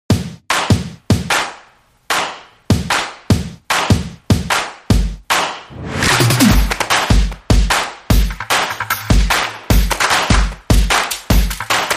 Percussion

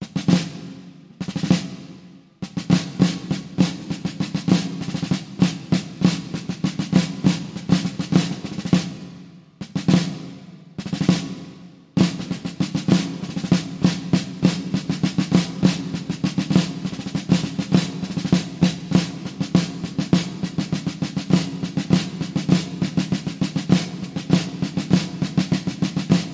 Solo Snare Drum

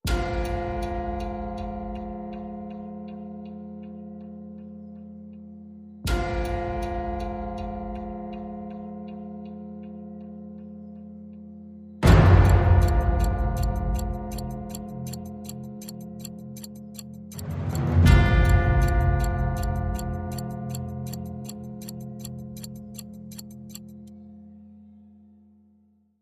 Clocklike F

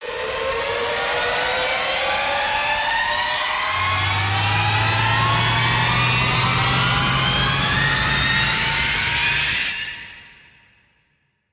Rise Short

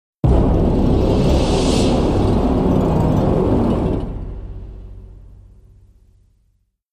Low Danger Atmos

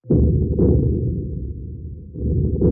Sting Faint Heartbeat

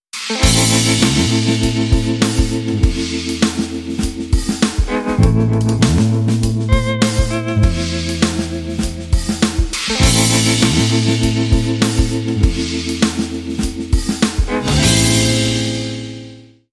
Heavy Handed Energy

